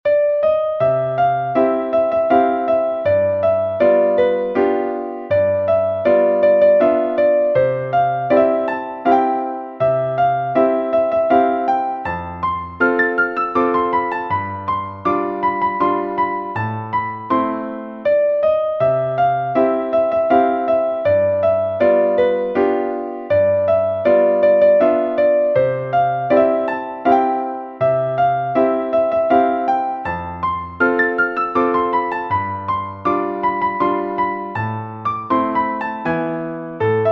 Piano

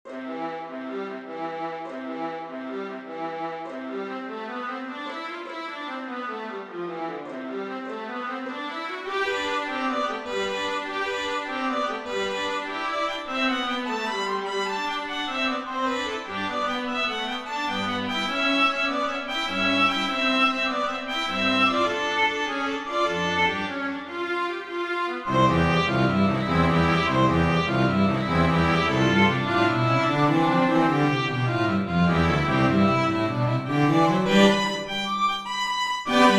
String Quartet

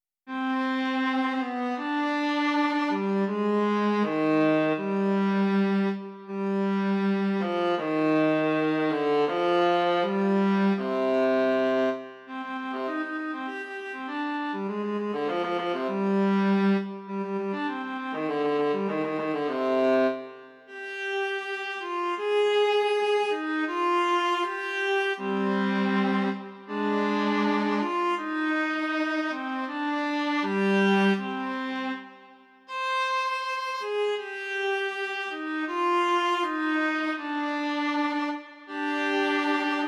Soliloquy for Viola

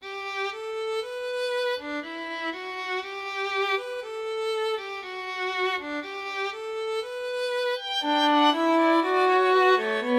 Three-Voice Fugue

